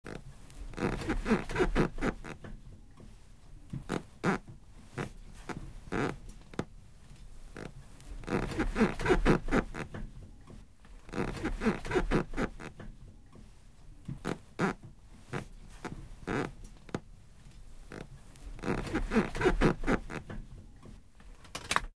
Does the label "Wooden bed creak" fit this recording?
Yes